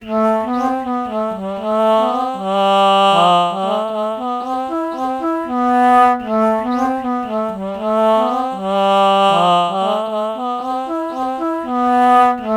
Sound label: alto flute ah melody morph low